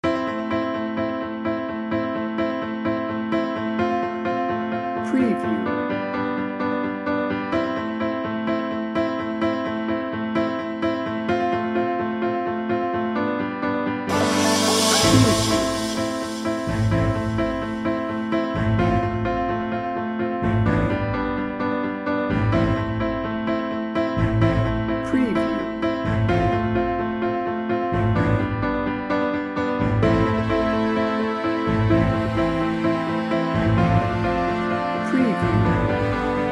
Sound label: adventure junkie bed no drums